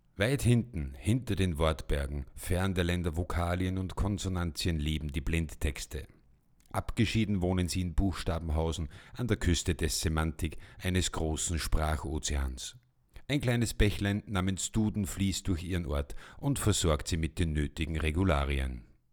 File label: Rode NT1-A